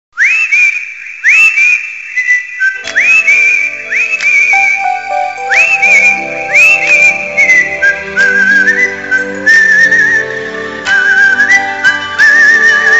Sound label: old bollywood whistle ringtone